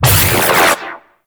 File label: Radial Aura; Amalgam Carrus Pilot; Abilities